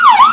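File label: yelp, siren; vcmod; els; cencom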